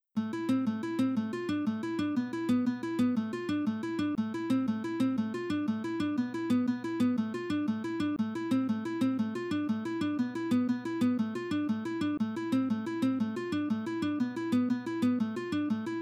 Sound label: pajarillo verde cuatro